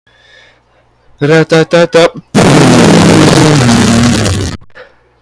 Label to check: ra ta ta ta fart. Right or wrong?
Right